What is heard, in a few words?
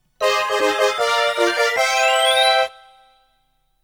Round Clear; Namco Game Sound Express Vol.8; cosmo gang the video the puzzle